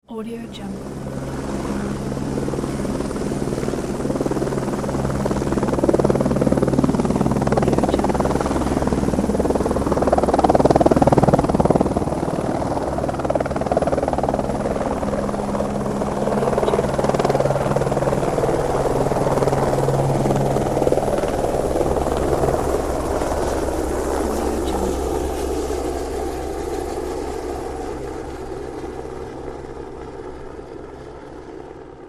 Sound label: helicopter